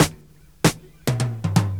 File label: DRMROLL; E-Lab; X Static Goldmine 2; akai